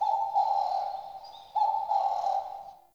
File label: Wetland Forest Day Bird Distant; Ambience